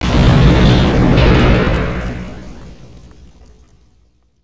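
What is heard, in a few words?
counter measures explode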